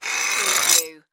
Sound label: Debris Glass Scrape